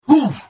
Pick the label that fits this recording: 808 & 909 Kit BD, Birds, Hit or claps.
Hit